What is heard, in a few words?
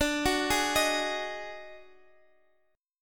Eb Minor Major 7th Double Flat 5th